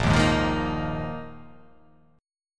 A sharp 7th Suspended 4th A sharp

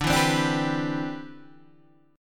D 13th Suspended 2nd D

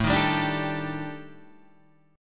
Eb Major 7th Flat 5th A, guitar

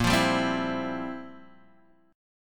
F Major 7th Suspended 2nd Suspended 4th

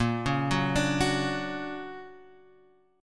D Augmented Major 7th Bb, guitar

